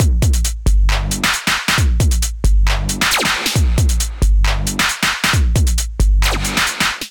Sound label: Kit 135 bpm, Drums Basic; MIDI Clips; Delicate Chaos Kit; Ableton; Factory Packs; Skitter and Step